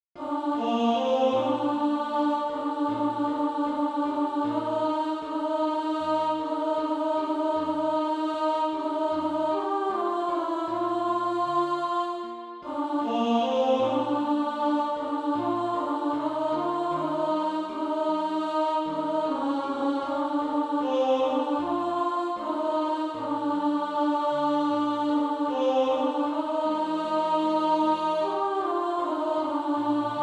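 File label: alto, Practice Music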